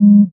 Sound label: Scifi Menu Tone; Menu FX